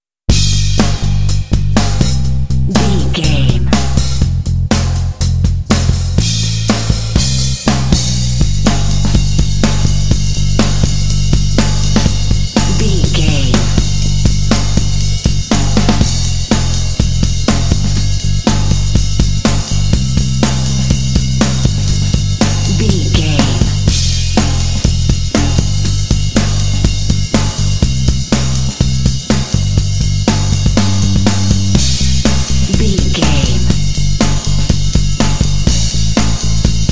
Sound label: country rock jingle